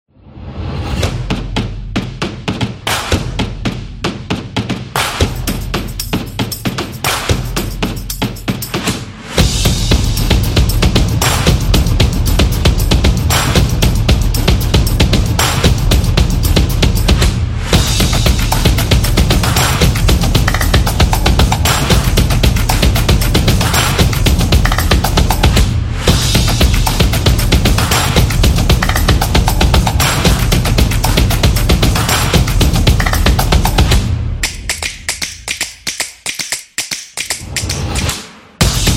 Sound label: The Stomps